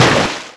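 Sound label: water slap, Splash; Splashdown; XBOX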